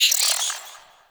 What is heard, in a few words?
Venus Landscape Cave Creature Calls; Warframe; Venus Open World; Ambience